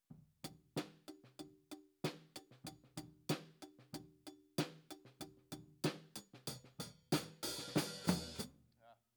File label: HiHat, 94bpm